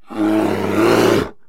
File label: bear grunt; mob